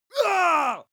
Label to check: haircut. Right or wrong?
Wrong